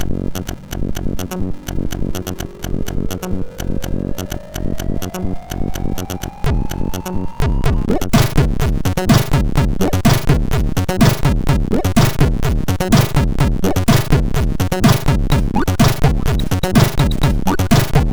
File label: Bass Echo Test, MOS6581R2 sid